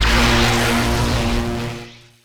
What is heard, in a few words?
Laser Fire